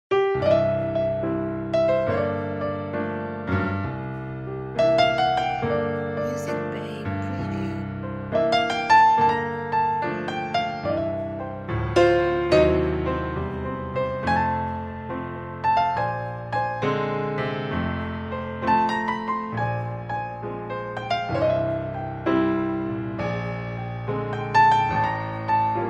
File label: Slow Piano Blues